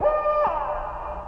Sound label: silly elec fx; drums full kits; unusual kits and fx; Silly Electro FX Kit